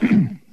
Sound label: ahem male; ooh ahh; human